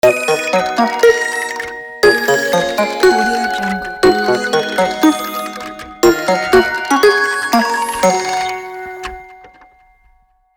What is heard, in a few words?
podcast toys logo